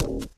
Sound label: glass, footsteps walk; player; source engine; left 4 dead 2; boomer